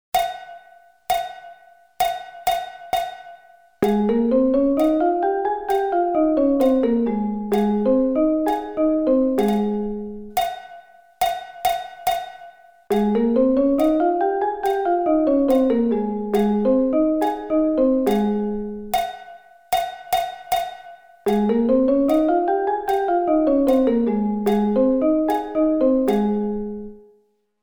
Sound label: Ab Scale